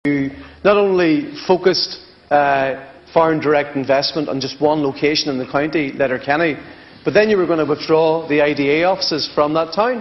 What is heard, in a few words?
pad